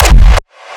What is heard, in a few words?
Gated Kick; The FREE Raw-Hardstyle Kick Pack Vol.1